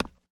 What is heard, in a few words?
concrete step; Original TK Sounds